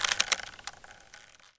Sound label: Rope Creak